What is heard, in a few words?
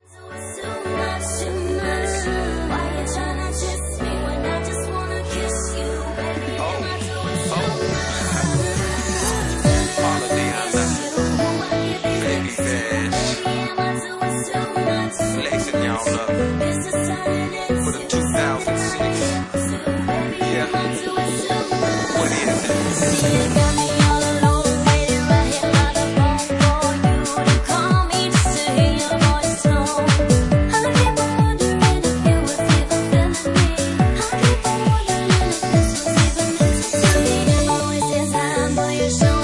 Organ Remix